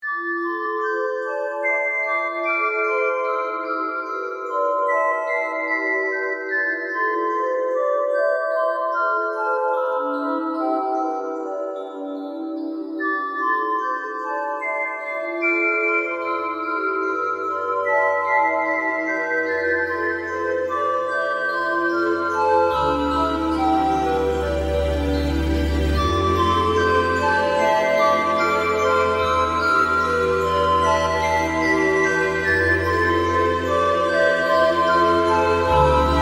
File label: Game Over Theme; friday night funkin yoylecake central; Night Mote